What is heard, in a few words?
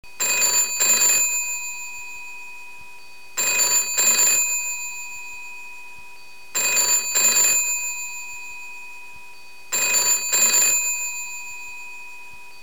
phone bell uk